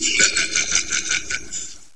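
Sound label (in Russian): смех; sloveshij dolgij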